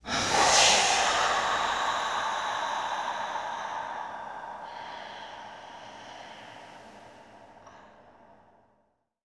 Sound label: Spectral whisper